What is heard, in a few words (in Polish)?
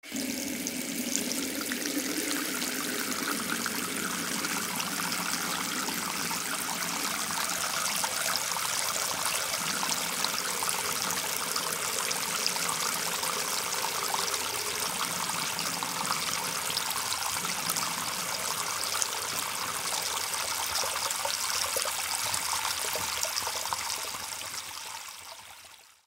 Woda z kranu